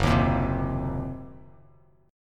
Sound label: Gb 6th Flat 5th, Bass Standard; strummed; Gb6b5; JGuitar